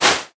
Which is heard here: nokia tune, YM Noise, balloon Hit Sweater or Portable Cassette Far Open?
balloon Hit Sweater